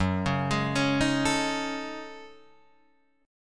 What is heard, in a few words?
Gb Minor 6th Add 9th Gb down Guitar Open D